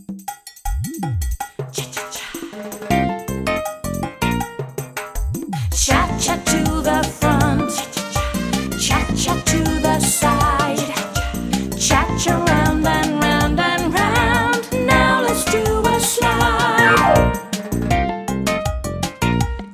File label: Cha cha cha